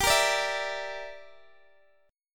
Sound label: G Minor 6th, Guitar Open D